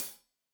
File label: Hat Tight, Hi-Hat, Cymbals; Deeply Sampled Drums